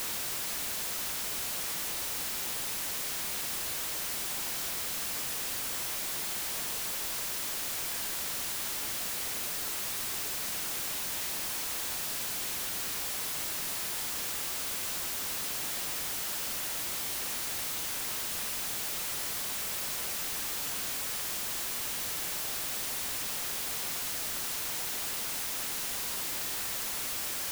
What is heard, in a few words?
white noise